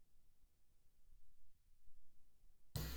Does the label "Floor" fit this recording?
Yes